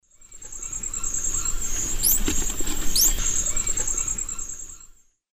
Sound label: Glaucis hirsutus, flight calls; S. America; Eastern Lowlands